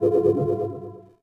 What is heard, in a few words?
ui notification